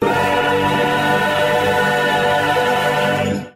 brwah, Voice

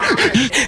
breath four quick; ooh ahh

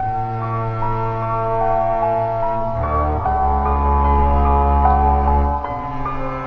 piano and strings, Sad Loops

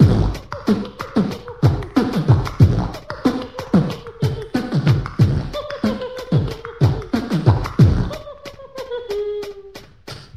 laughing all the way, Beatbox; Drum loops

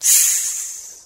sss male; ooh ahh

